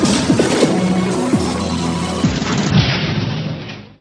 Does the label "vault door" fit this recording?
Yes